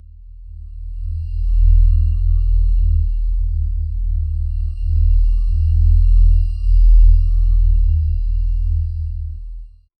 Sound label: continuous organic crysta, crystal